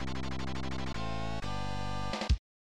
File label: Finish Level; Super James Pond 3; SNES